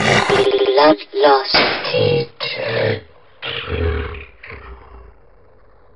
gonome pain; gonome hev

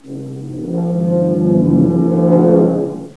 groan; sven; caged; ambience; pgroan

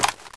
mp5 draw; weapons

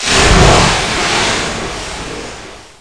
water splash, ambience; caged

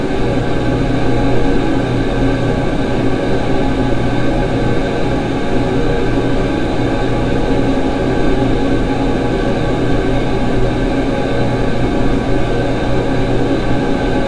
ambience; caged

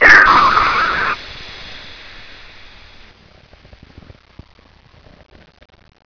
death; sven; zombie cleansuit

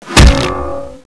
pipe hit bod; weapons

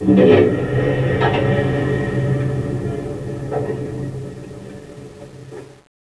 elevator

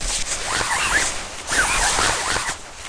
rappel, ambience; caged

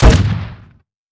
m79 fire; weapons

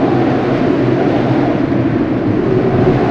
windz; ambience; aomdc

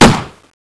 gun fire; weapons

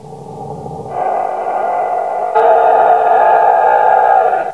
scream; vats; caged

